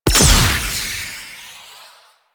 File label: Projectile Fire; Warframe; Cephalon Weave; Weave Boss